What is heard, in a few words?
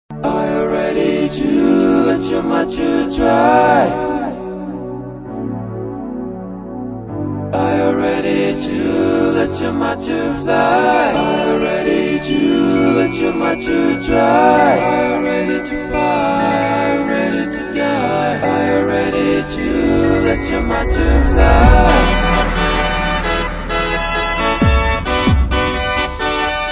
hi-fi digital accordeon